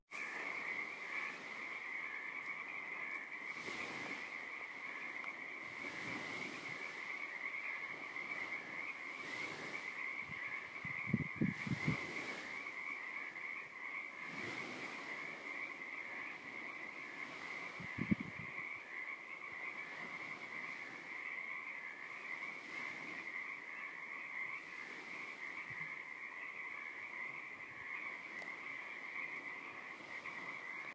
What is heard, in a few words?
frogs on Sucia